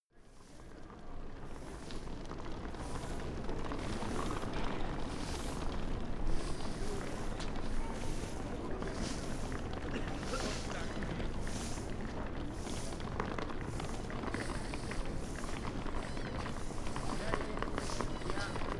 city winter trolley case

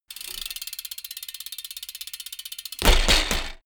bmx bike ramp fly land

